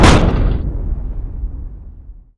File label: EXPLOSION Medium Blast Kickback Fading Tail stereo; gamerip; The Looker